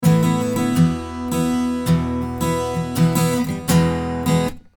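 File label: Acoustic Guitar Absorb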